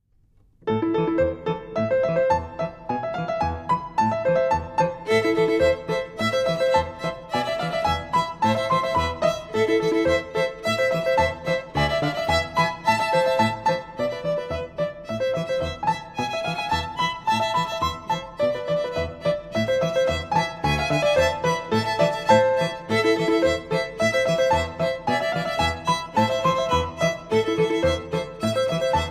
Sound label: Wintertime (Fast), fiddle